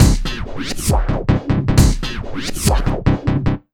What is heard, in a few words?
Big Beat Drumloops, 135